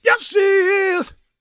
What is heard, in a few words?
GOSP MALE, Vocal Planet Gospel